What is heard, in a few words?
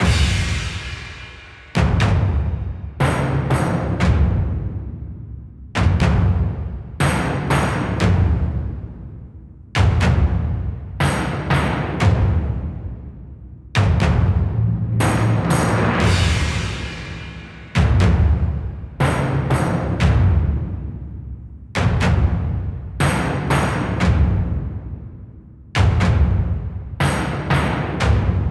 Percussion - Amin 120BPM; Construction Kits; STEMS; Ghosthack; SkyRealm; Kit 05 - Heart Of Fire